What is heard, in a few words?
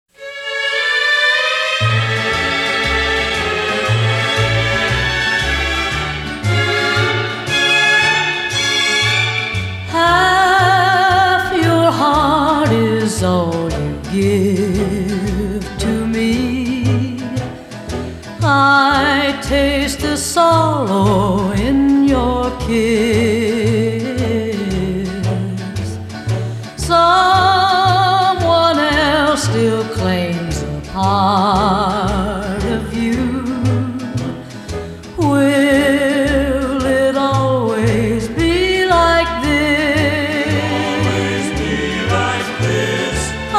rockabilly; Rock’n’Roll